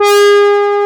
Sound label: AN SYN, SYN & LEAD; SAMPLE WAVE MIXING 2